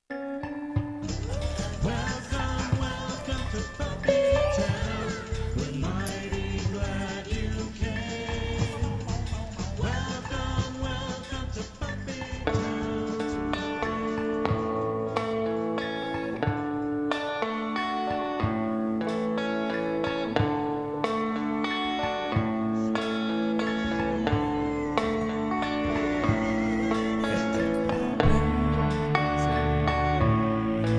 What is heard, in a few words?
Cute Song